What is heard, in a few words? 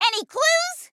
Combat; Velma